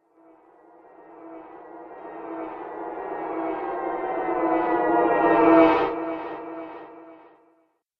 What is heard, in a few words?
Engine Hum Spaceship; Spacecraft Sound Effects